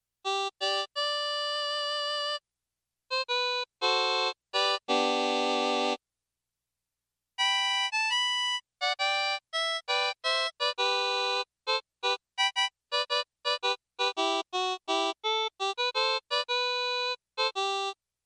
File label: inst harp